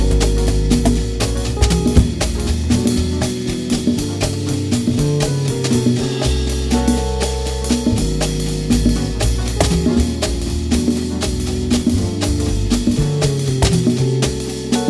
Bass Run

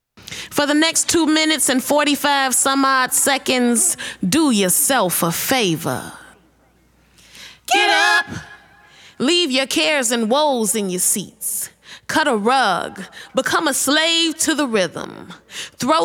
Accapella

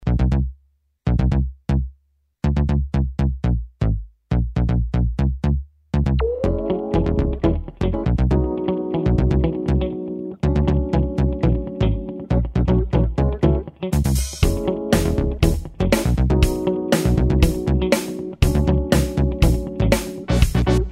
Electric Organ Version; Fortnite

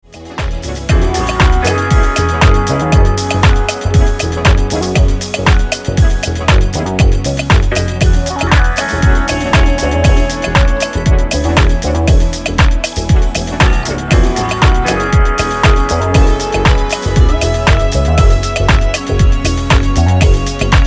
Inst

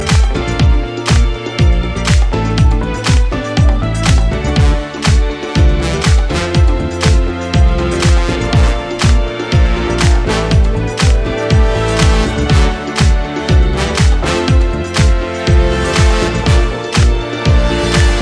Instrumental

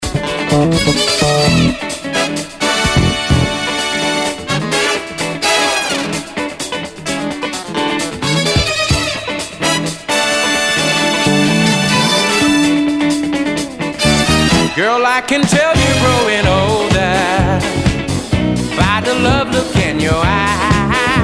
Brass Taps

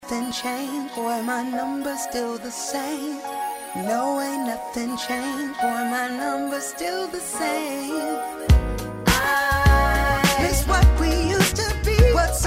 Jazz Jam Session

